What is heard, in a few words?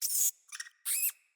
Deco Rat Idle B Variant Two B; Ambient; Vocals; Verminia